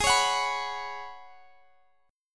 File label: F sharp Suspended 4th G sharp; strummed